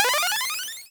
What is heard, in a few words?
light ball pick